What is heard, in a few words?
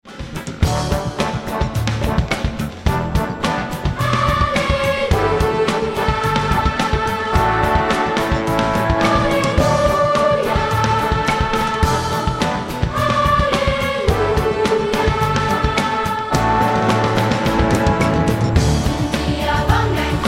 Ngiyabonga Nkosi Hallelujah